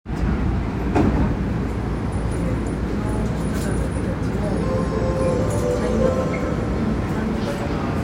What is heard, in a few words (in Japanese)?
池袋線江古田東, train sounds; 西武